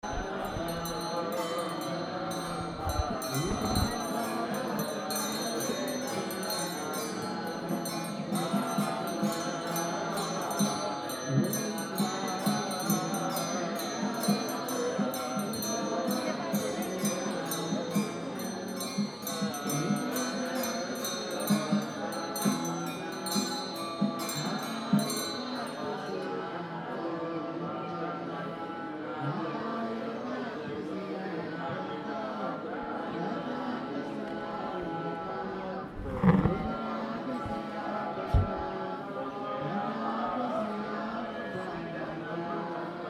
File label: Chants Bodh Gaya; North India